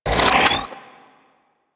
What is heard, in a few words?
Primary Reload, Weapons; Sapient